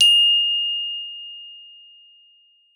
GLO F5, GLOCKENSP; Xsample VOL-4

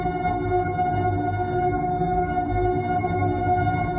Tonal Re Draw, sparse soundscape

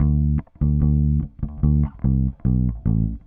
Bass, Indie Rock

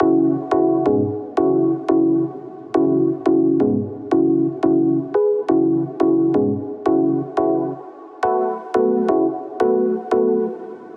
Lead; neuro; InsideInfo

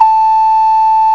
OCARINA; CMI Sound Library Rev 1.3; WIND

